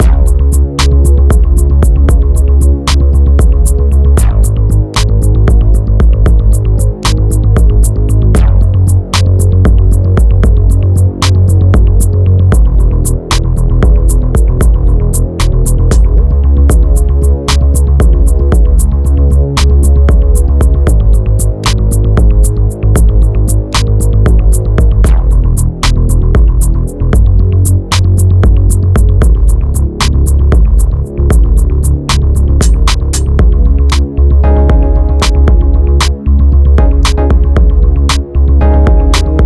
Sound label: Tense